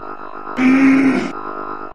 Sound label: drone; Gachitale